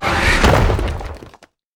dive; sfx; murglun

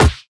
basic attack melee; heroes nyru

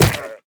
enemy sheep death

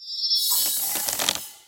Ground Freeze; Elora

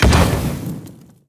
sfx towers cannoneers incendiary Ammo impact

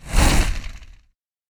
fire breath end

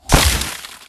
crystal ice

